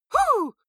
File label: female fight "huh"; vocals; comedy-cartoon; soundpool